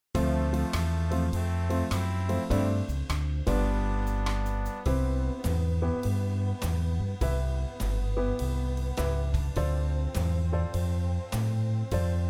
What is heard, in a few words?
jazz blues swing time